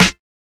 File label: Snares